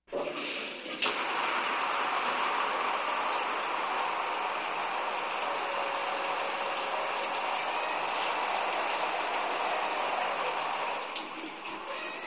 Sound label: Shower On with mat sound